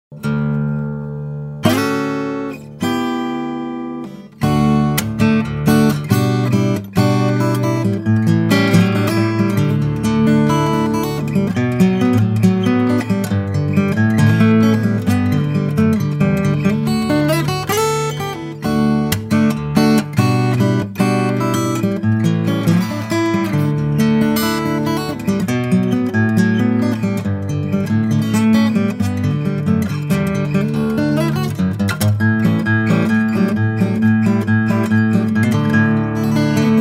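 Guitar Sample 34a, Vintage vs Vintage style contemporary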